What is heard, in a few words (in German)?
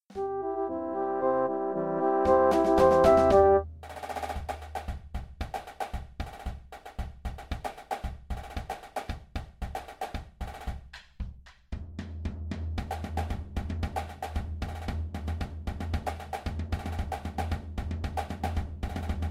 Fanfare